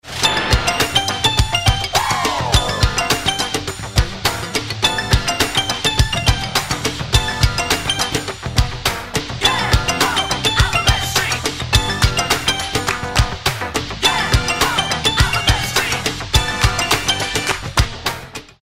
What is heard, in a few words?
roland d-50